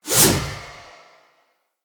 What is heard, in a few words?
Sphere Polearm Throw Perfect B, Weapons Player